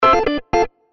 Message, Organ; HTC; Countered

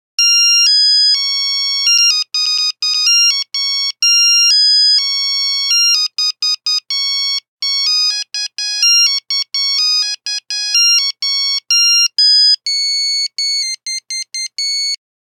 Nokia Buzzer; Windows Phone apps; 5110 Helan

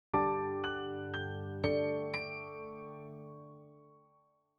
Power On; Oppo; Find 5 X909

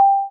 Video Record, ui; Kyocera; Cadence LTE

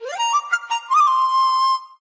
Flute, notifications; Honor; MagicOS 7.1

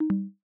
discharging disconnect sound; OnePlus; Nord; ui

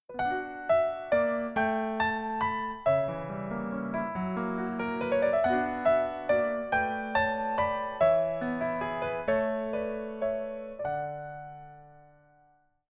piano, ringtones; Coolpad Mega 2.5D

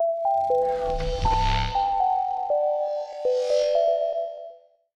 Power Off, phonetones; 5GX Quantum; SK Telecom

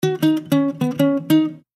Guitar, phonetones; Aio Wireless

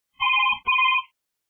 Ringtone; Okapia; Alarm tones

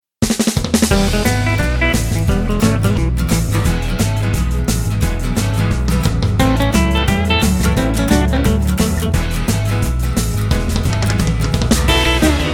Unplugged, ringtones

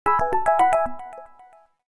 A toy watch, alarms; Samsung GT-i7680

